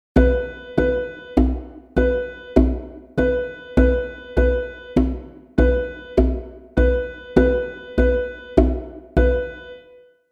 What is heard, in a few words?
Missed Beat